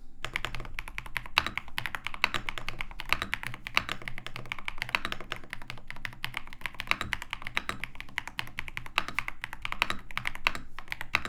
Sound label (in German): RedDragon WYVERN K761 PRO Soundtest